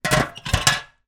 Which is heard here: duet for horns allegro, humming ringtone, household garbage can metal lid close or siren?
household garbage can metal lid close